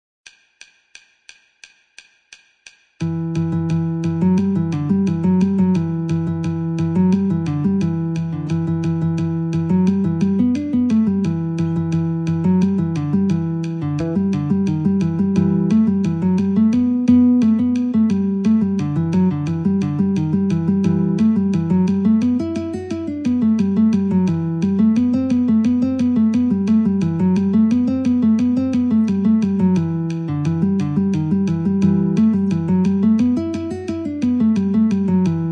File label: Guitar metronome 88